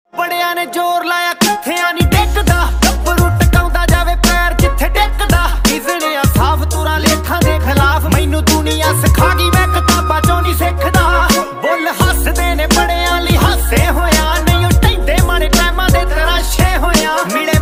Dance Punjabi Ringtone

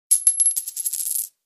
Coin Ringtone

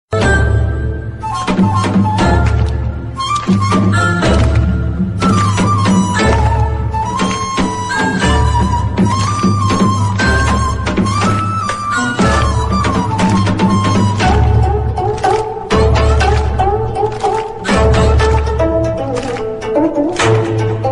Blushing Ringtone

